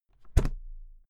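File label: briefcase close, Büroarbeit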